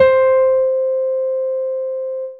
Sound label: PIANO, Dance Mega Synth; Best Service